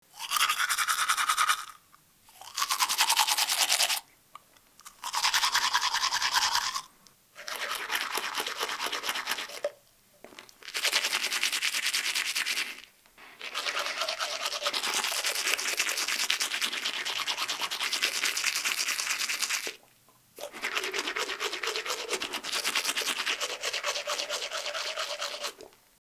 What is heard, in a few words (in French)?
Se brosser les dents